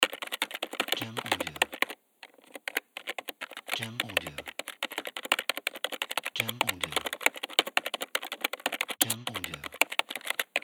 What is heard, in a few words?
Typing Keyboard